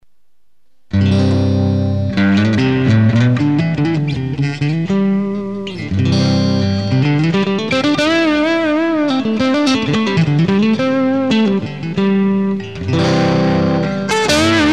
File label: Hybrid Neck Mid Drive, guitar pickups